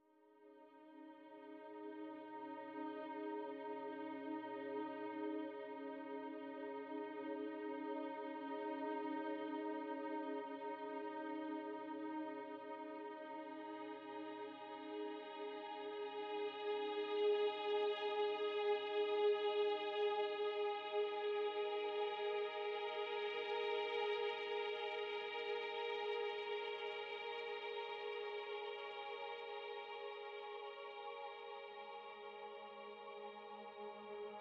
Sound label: Spooky Mix (Orchestral Ver)